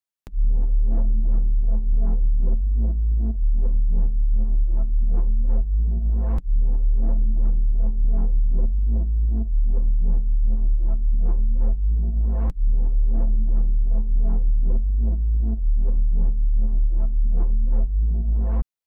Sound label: 157 BPM A Min Bass; Melodies; Loop Stems; Melody Mystery Expansion; Frost